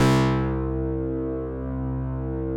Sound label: MW PULS